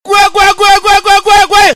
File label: charge, Voices Emotes